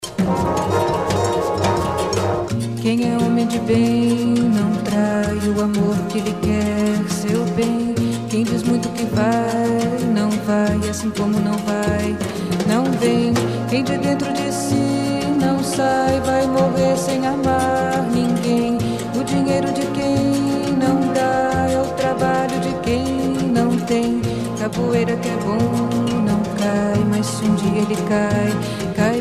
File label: cansao brasil berimbau